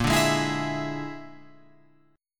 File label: Bb 7th Flat 5th Bb, Guitar Open F; Bb7b5; strummed; JGuitar